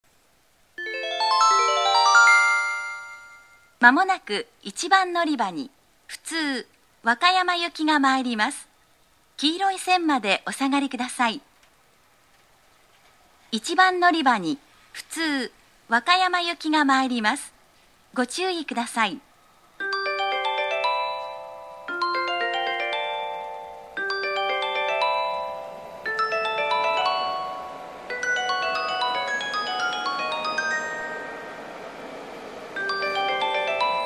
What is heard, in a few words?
track1, eki; nishinihon